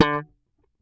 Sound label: Bass Pop Dark D, Electric Bass One Shots; Slate Digital BASSHOLE Sample Pack